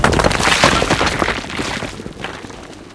break stone

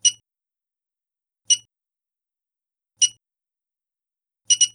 scripted c4 tick

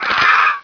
pain; zombie soldier

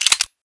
bolt back, weapons

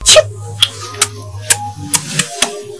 kiss

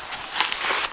med charge

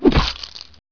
hit; desastre

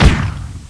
punch fists; weapons; vengeance

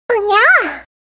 unyaah

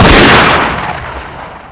fire; weapons; 357

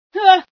scream, female sci

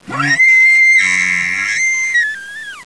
pit drone alert; betapitdrone

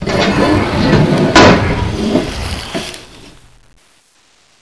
door move

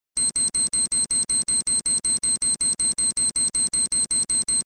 c4 beep

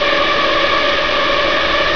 tubecritter buzz

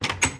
open

